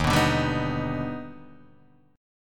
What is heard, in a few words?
Eb Augmented Major 7th Eb, Guitar DADGAD